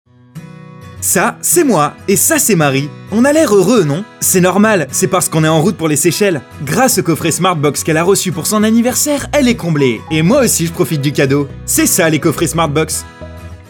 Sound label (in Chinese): 轻快宣传片